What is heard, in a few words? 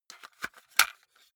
cassette case insert soft; objekte; kassette